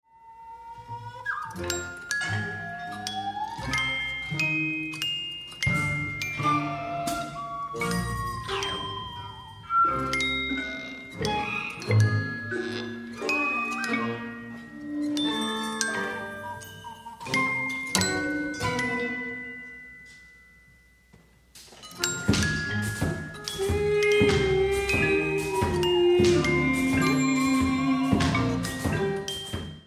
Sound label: Music Box